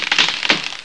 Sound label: branch